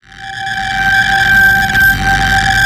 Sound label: light ray charge up; dogma